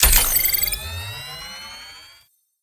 toner node connect

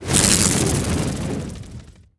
jump, antlion wings; creatures

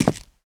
footsteps concrete; player

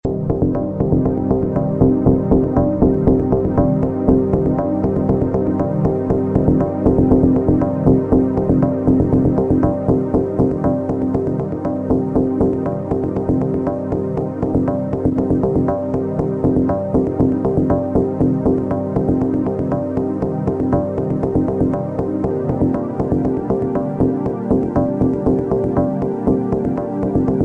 start round, music

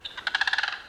crane; ambient; anubis

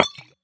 weapon molotov impact; physics; weapons

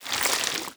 close, zombie blind, foley; creatures

